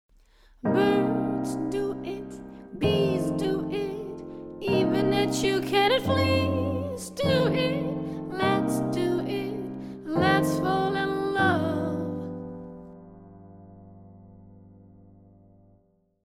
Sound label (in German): Stimme